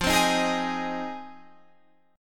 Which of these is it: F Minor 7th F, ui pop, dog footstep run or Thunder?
F Minor 7th F